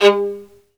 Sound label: Synclavier Strings, SOLO VI; ILIO